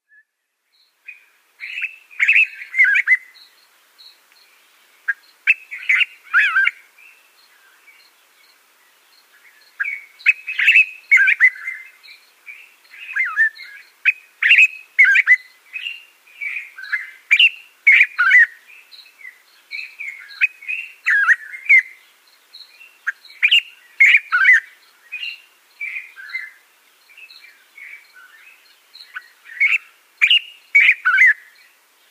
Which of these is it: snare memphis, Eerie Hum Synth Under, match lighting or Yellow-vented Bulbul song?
Yellow-vented Bulbul song